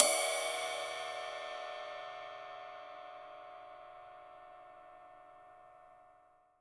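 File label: CYMBAL, real drum kit; HIPHOP KIT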